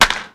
Baseball Bat Crack

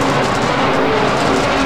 Door Large Metal Loop; SFX Kit; Doors

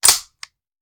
Machine Gun Foley; Sound Ideas; Guns

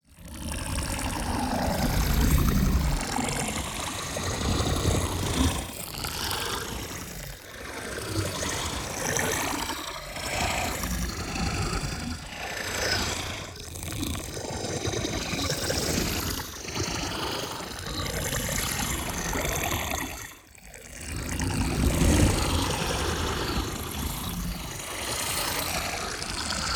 MOVEMENT Organic Absorption; NEON; boom library

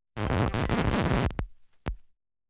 Needle Across Recording; KMP Film Video Sound Effects

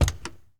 Porsche 911T Door Open; Automobiles

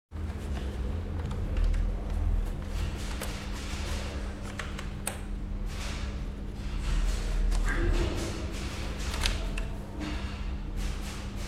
Police Station Ambience; Sound Ideas; Series 2000

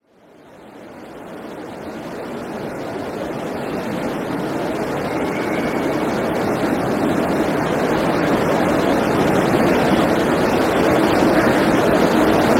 stereo street; Pitch Black - A Detective's Demise

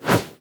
swoosh low; Blind Gladiator

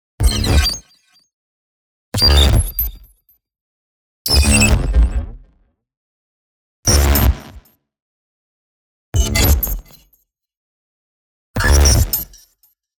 NEON ZAP Energy Scream; boom library

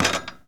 Street Ball Wood Back; SFX Kit

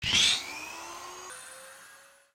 bouy sonar hit hydro; Blind Drive

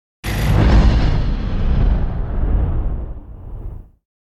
Impact Heavy Destruction Industrial Hit; Hollywood Edge; sound designer's toolkit v2

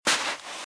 Footsteps - Sand - Dry - Walk - Right; RadwinIsland

